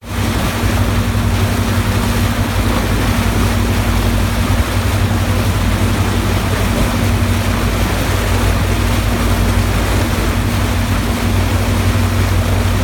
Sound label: On A Motorboat